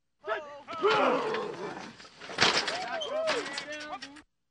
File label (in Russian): vozglas i udar v setku; Звуки футбола; Звуки игры в футбол